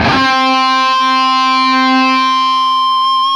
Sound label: LEAD C 3, Guitar; East West; Steve Stevens Guitar Samples Collection